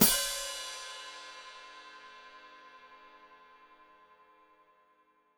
Hat Closed Open, Hi-Hat; Cymbals Stereo; Deeply Sampled Drums

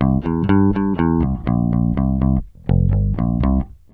NUTS 122 C, Bass Grooves; USB Soundscan vol.30; AKAI

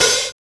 HH, Dance Mix

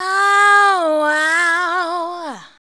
"auuwauu", shouts; Best Service; Hyper Dance

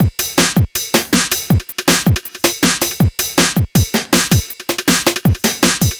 Hittin Beat, Drum N Bass; Sonic Foundry (Sony Creative Software); Knutrix Drum & Bass

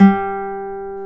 Guitar Classical, Nylon String; Roland LCDP02 Guitar and Bass

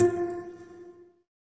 SYN PIZZ, JD 800; Sound & Vision - Gigapack I CD 2; JD 800/990

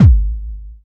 DR. LOOP, DRUMS; X-Static Goldmine 3

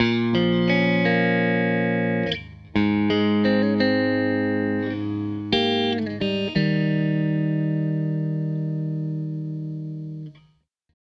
Tele Guitar Loop; Sonic Foundry (Sony Creative Software) - Six-String Orchestra

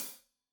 Hat Loose, Hi-Hat, Cymbals Stereo

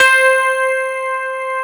DIGIPF C4; AKAI S-Series CD-ROM Sound Library VOL-2

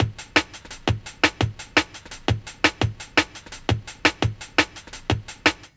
LOOP, Jungle

